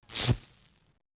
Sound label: spark